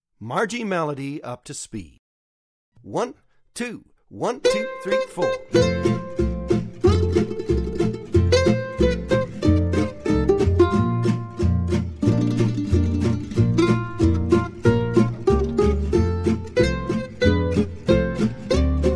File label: mando mel fast